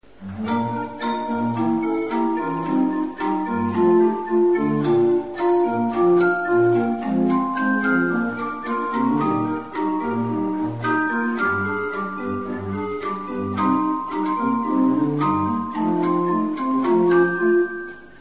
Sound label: tank, organ harp